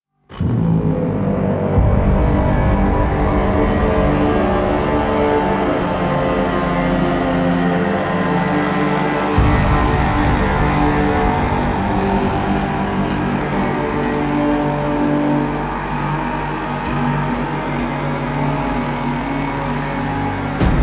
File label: Climax Cue, Music; Warframe; DE; Entrati; TennoCon2023